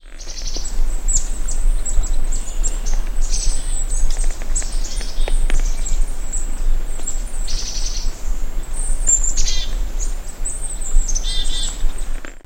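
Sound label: call, black-capped chickadee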